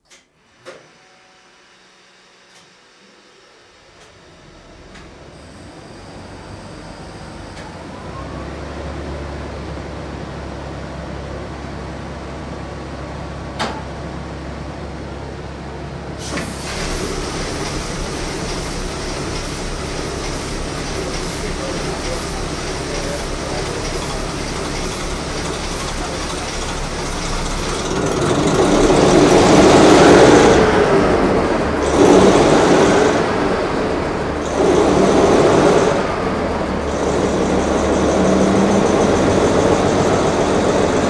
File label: main engine